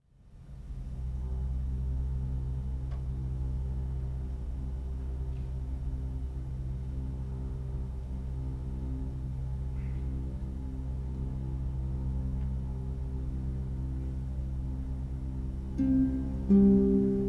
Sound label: orchestral